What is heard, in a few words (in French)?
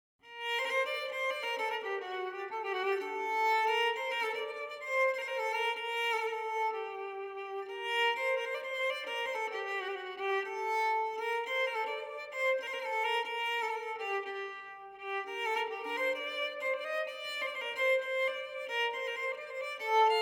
baroque violon orne; airs sérieux et à boire